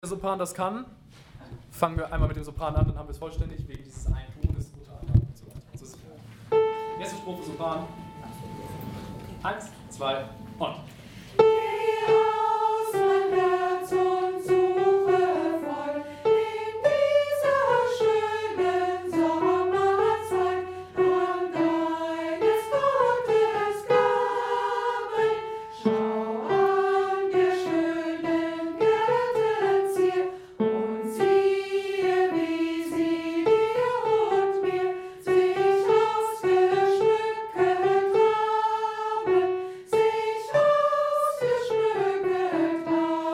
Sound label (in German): Sopran; Geh aus mein Herz